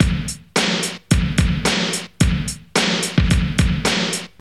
109 bpm breakbeat sample A key, kick tuned to the A note; drum loop